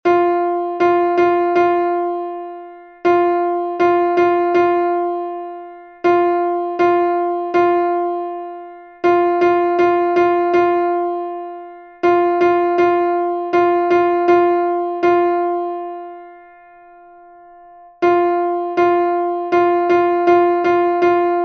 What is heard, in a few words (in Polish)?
Temat rytmiczny